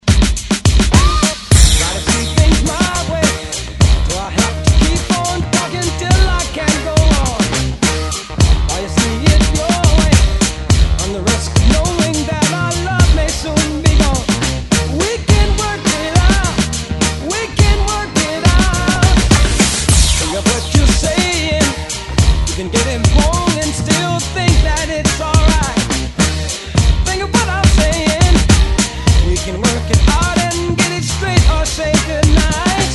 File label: ReDrum 105 Bpm; DJDOLLS